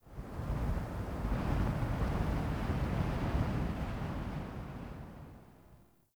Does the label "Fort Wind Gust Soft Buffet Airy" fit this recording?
Yes